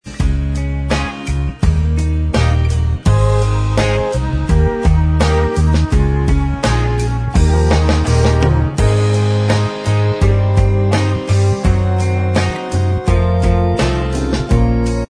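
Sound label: j pop, instrumental track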